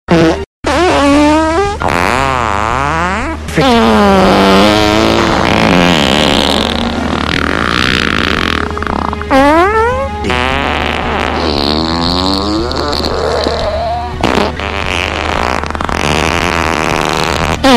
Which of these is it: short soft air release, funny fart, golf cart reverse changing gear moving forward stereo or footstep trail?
funny fart